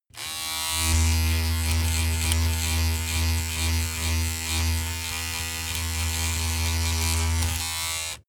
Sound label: razor on load pov short boomy; maschinen; rasierer